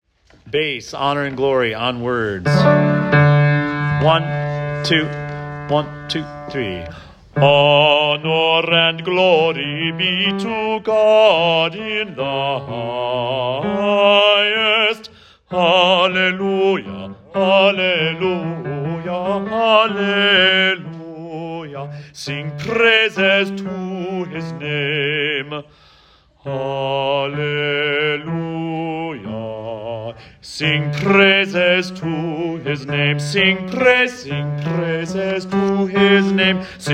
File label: Bass Honor and Glory words; Wando Choir Class Placements